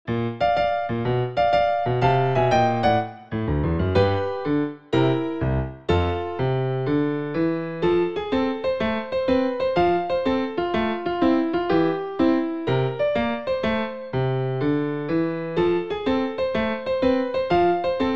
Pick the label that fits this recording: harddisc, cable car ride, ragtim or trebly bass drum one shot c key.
ragtim